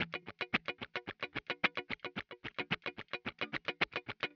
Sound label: Electric Guitar, Loops; Guitars